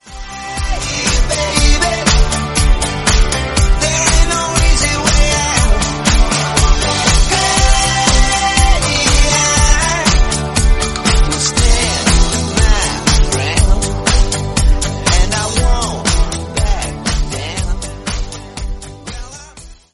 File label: 120 bpm G